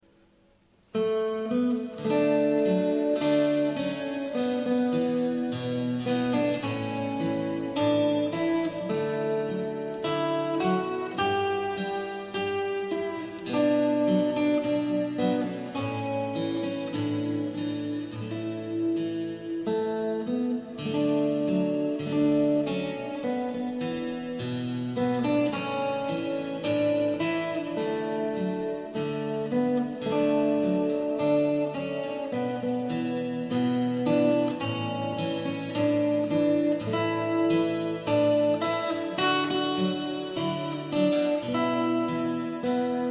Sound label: fingerstyle, guitar